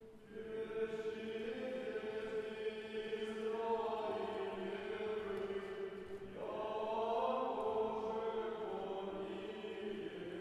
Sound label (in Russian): Рогожский хор 1 глас ирмос 8 песни; В пещи дети израилевы